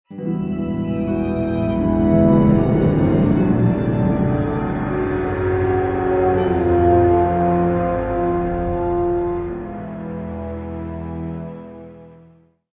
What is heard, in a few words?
Jingle Negative; The Guild 2 Renaissance